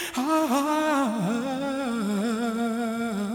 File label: VOC